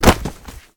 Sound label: Pigeons; Rabbids Multiverse